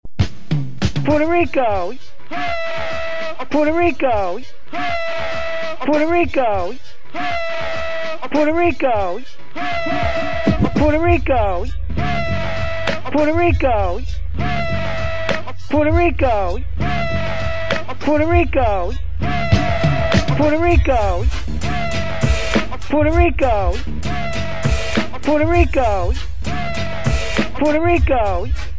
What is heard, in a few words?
Rap; Puerto Rico